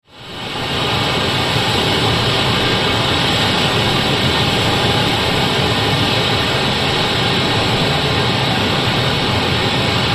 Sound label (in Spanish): agua; ruidos